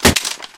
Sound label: weapon impact hard, metal